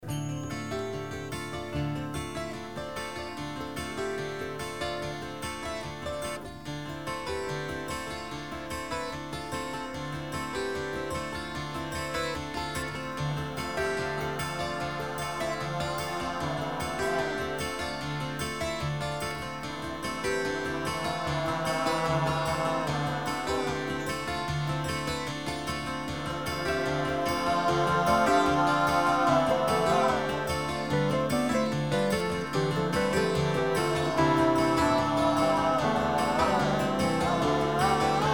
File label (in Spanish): hasta solo flauta; Promenade I